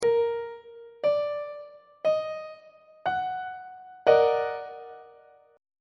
d sharp minor major 7th 2nd inversion on treble clef